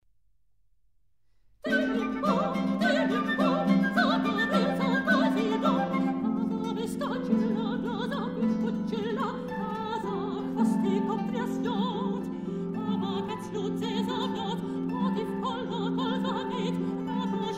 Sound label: Russian Songs for voice piano; Dissident song